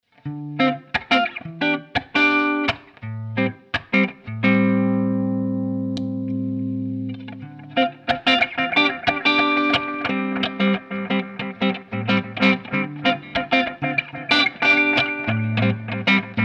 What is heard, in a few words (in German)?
delay lay hals steg